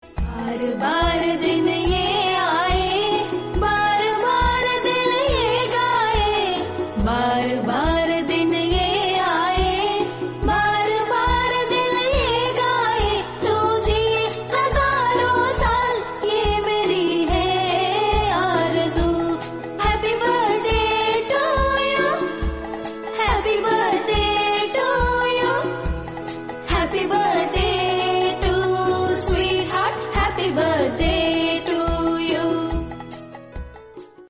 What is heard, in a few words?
happy birthday hindi song